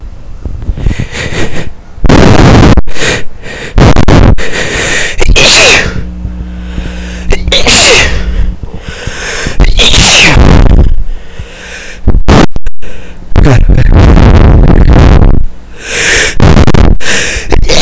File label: Sneezy